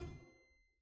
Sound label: Harpsichord Rel Main F3; Chordophones; Releases; Harpsichord, Unk